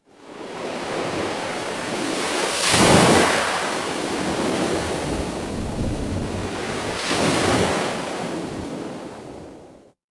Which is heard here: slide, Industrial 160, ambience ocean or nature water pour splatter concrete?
ambience ocean